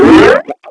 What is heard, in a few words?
death; quake2; zumlin